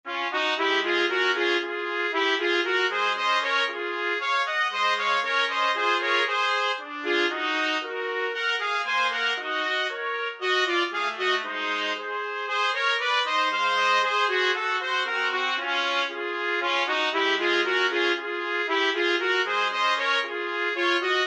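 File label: 4 trumpets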